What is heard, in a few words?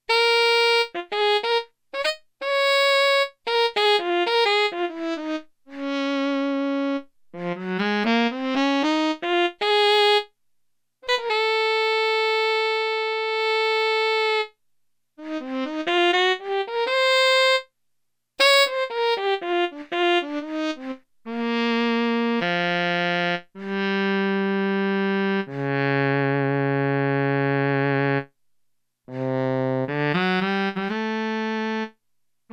Tenor Sax, synth